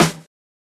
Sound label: Round Snare Single Hit E Key, snare single hit tuned to the E note; snare drum samples